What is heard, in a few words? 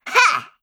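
attack, Voice Line, Korean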